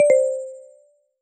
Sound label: error